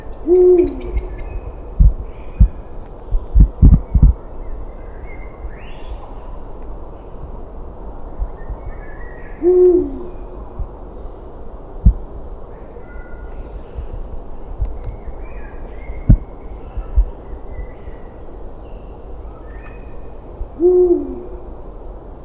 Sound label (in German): uhu